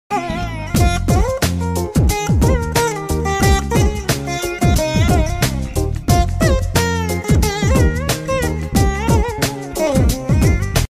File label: telugu heart touching ringtones